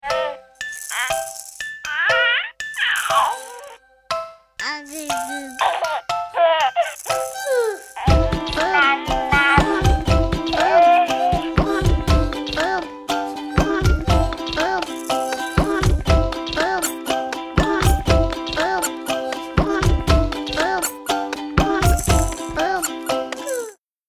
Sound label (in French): Le p'tit rap